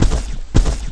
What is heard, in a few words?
Run